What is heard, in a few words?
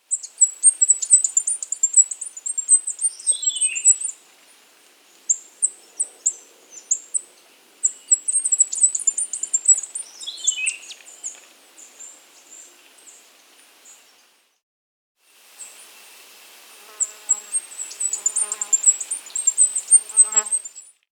Deep-Blue Flower-Piercer; S. America